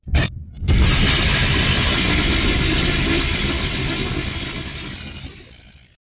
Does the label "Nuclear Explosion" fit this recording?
Yes